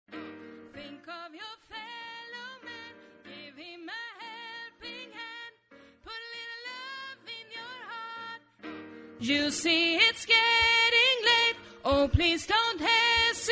bas

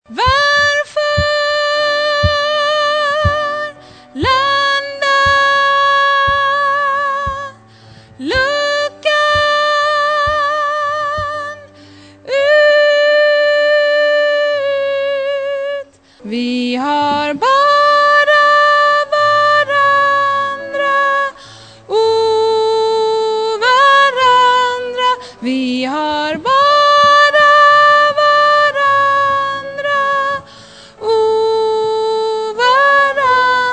vi har bara varandra tenor, stämmor

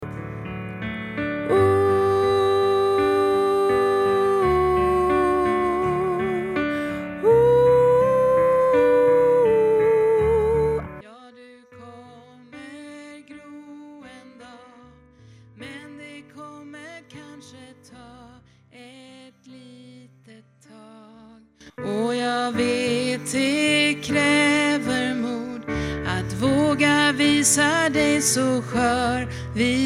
starkare bas, stammor

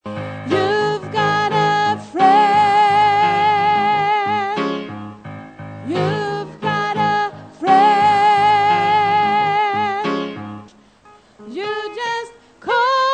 tenor, stämmor

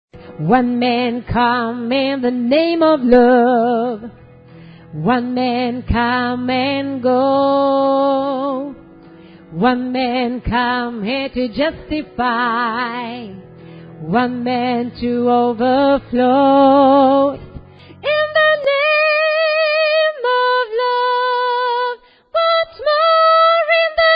tenor, stammor

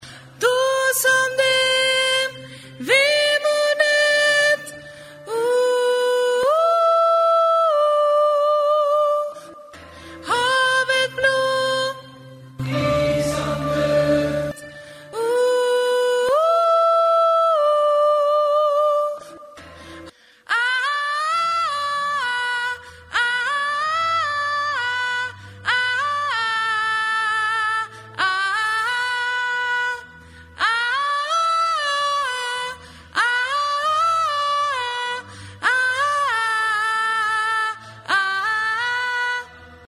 vintersaga tenor, stämmor

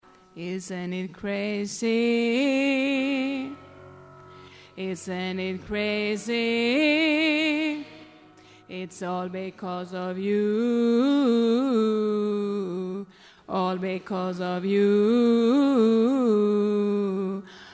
you sopran, stämmor

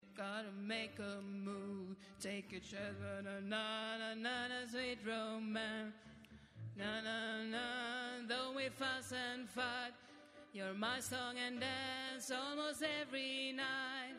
bas

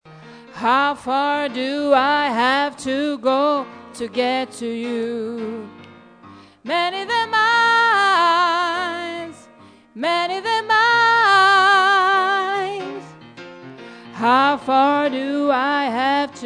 alt

